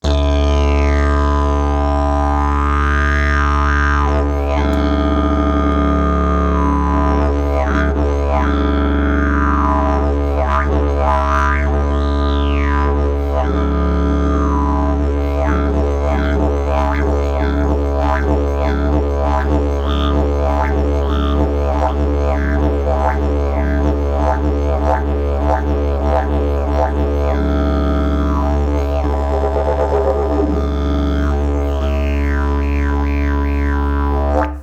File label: didgeridoo, jilm; temnik